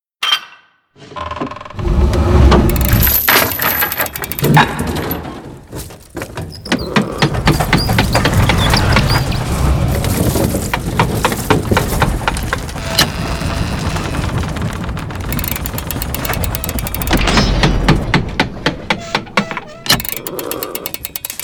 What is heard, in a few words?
Machine Build